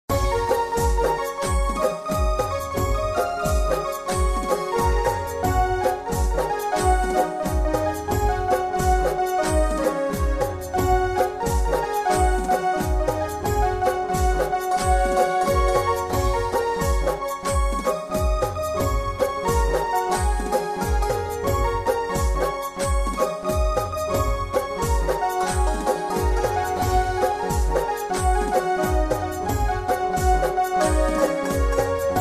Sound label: song gujarati style instrumental ringtone